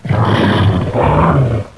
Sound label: shock trooper attack; svencoop; cryocell